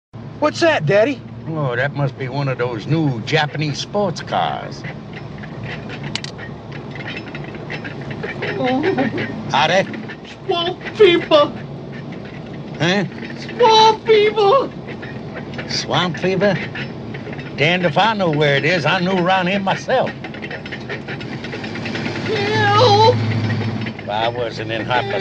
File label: japanese sports car